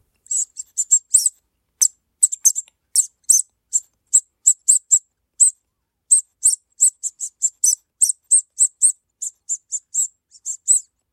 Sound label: Mouse